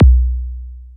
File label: Kick B1, drum machines